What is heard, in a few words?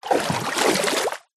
nature water movement splash swirl hand